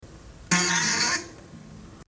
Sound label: loud fart in movie theater